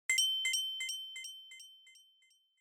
Onoma Inspiration High Delay

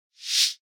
Noise Sweep Short

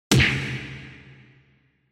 Hit Punch, Reverb

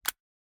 Cell Phone Hardware (Open)

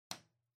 Light Switch-Box-Rocker (Wall-Gentle)

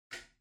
Wall Outlet Far Wall Unlock

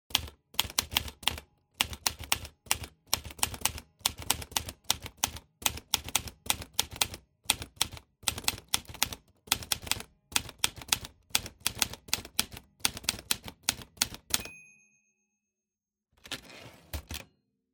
Typewriter Type

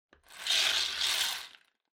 Drawing Machine Garapon Spin

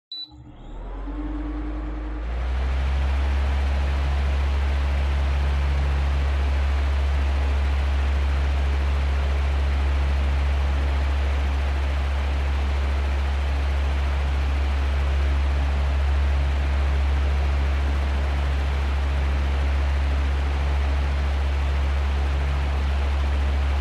Electric Fan High

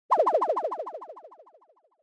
Fantasize High

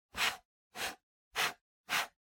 PC Mouse Move Hard Pad Short

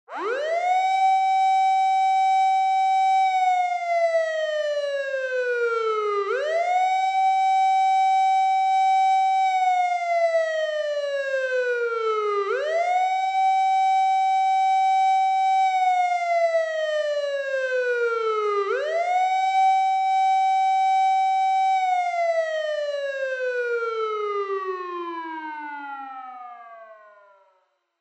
Police Car Siren Close

